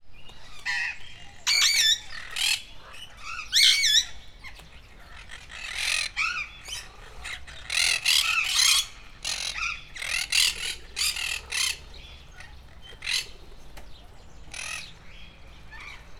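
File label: monk parakeet, screeching sound, from a large Alexandrine parakeet; debreceni zoo 2012; barat papagaj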